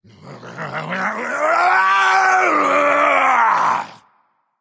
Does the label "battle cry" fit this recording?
Yes